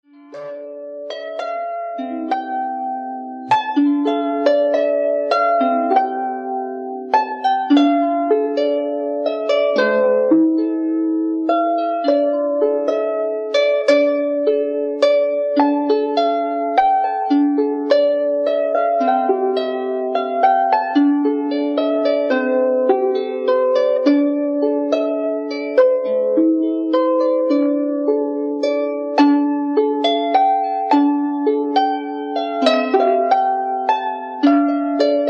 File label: Improvisation, harp